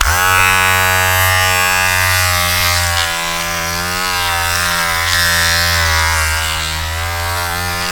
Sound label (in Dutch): scheerapparaat